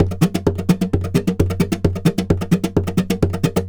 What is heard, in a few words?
130 rumba, Spanish And Gypsy Traditions; Soundscan vol.59; AKAI